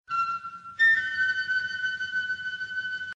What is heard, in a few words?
pan flute; classical